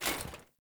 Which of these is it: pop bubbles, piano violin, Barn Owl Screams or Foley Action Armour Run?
Foley Action Armour Run